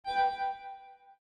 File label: button